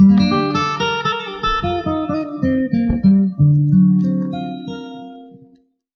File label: spanish guitar